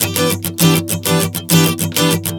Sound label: Strum 100 Em, One Chord Strumming 100 bpm